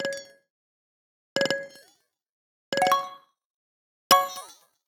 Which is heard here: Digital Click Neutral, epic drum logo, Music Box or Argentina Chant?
Digital Click Neutral